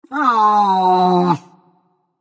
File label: "ugh"; smite; janus